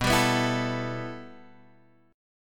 B 7th Suspended 4th Sharp 5th B down Guitar Half Step Down